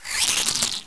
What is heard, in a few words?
Scorpion Die